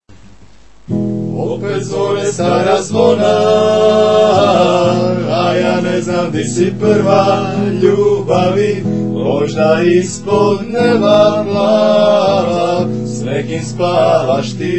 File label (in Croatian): zvona med